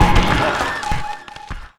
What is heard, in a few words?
Paper Bumpers; Sonic Foundry (Sony Creative Software); Esoterik Beatz; Paper Fx